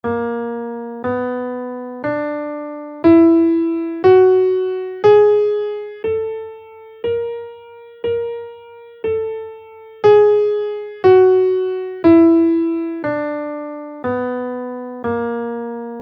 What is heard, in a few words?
enigmatic major scale in Bb, guitar